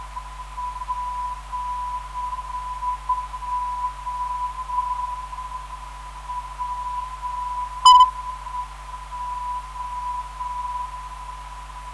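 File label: 398kHz, non-directional beacon; PEO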